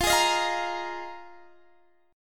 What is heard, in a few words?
A sharp 7th Suspended 4th F, Guitar